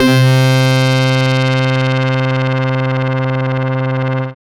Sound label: syn, Techno; Techno ID